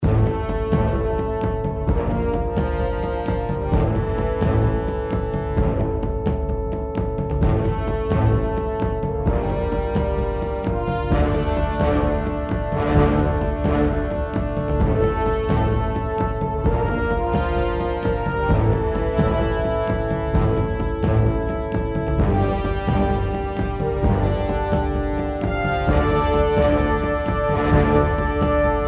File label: bgm; Ares God Of War